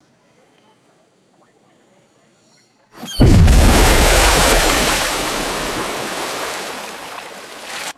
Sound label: massive heavy crash into water